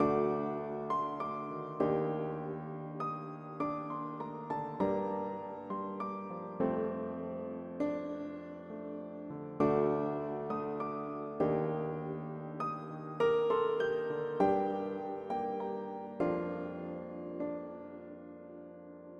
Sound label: Piano Loop 100BPM Gmin, classic; 99Sounds; Monosounds; Music Loops; Instrument Loops; Pianos